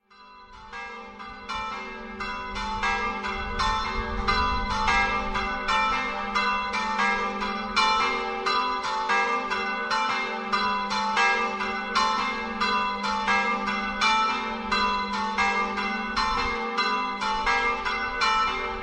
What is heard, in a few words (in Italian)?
RIVIS, campane